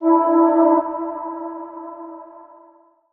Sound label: vocal daybreak E; Slate; AURORA Sample Pack; Vocals